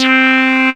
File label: CREEPY H C3, SEQUENCER; Best Service Alpha Dance vol.2